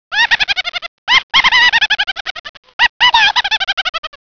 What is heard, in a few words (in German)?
Druckvorgang abgeschlossen; Win-Sounds